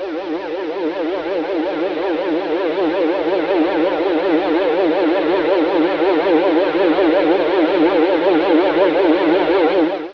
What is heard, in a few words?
ufo; svencoop; portal house